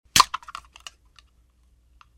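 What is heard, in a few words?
tin sound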